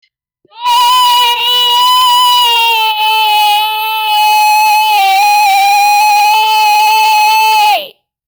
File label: man screams reeeeeeeeeeee